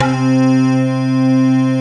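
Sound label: DIGITAL CHASE; Greytsounds; Scott Peers Sound Engineering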